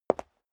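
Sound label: step high heels concrete single right, footsteps; schritte; menschen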